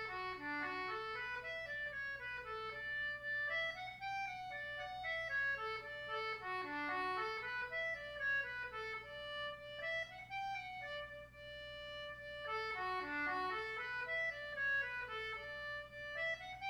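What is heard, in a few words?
Celtic Button Box, jigs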